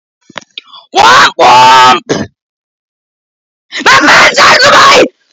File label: womp womp